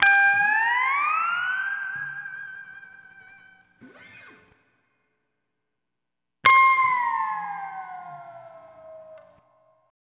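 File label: Steel Guitar C, Lounge; Zero-G Kocktail Kollection; Construction Kitz (Lounge); Volcano at Sunrise 074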